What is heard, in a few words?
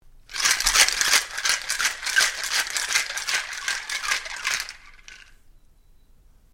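bar shaker sounds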